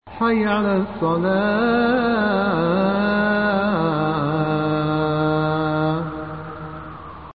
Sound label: the azan